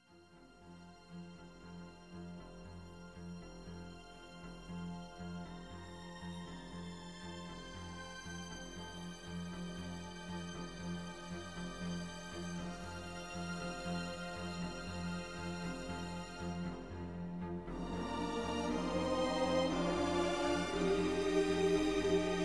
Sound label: Jingle Bell Boogie, trumpet